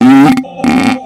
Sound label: farts